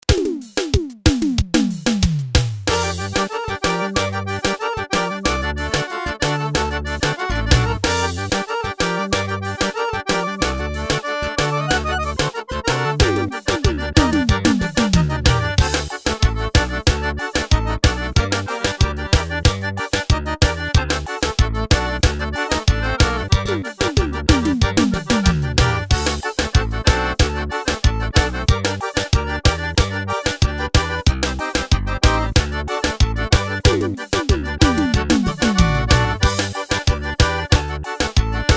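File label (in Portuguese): ritmos para teclados, pisadinha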